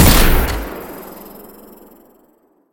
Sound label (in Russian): moshnij vistrel zapushennoj raketi